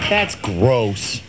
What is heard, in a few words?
eww